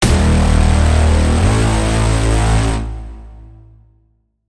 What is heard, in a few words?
Braam; Braam - Massive Reckoning; Routed Sound; Serum 2 Expansion - Quantum Enforcer Vol.1